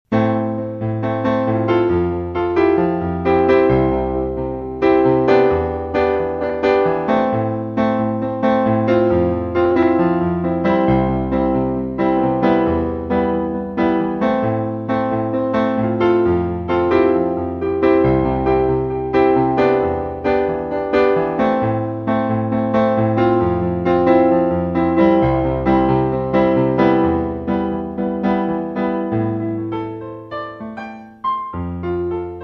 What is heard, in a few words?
INTRO PIANO DISCO; S90